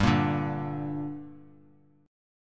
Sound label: G Minor G down, chord; strummed; JGuitar